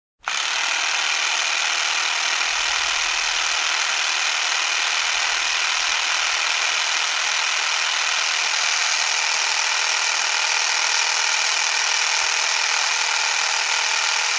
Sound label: Lawn Mower cutting grasses